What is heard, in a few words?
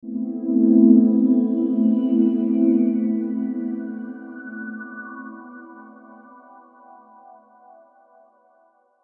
D&B Pad; neuro